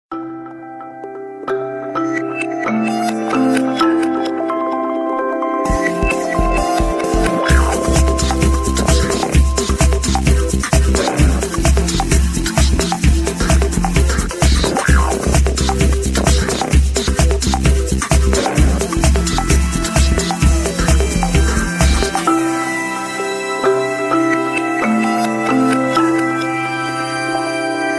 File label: nokia n95 ring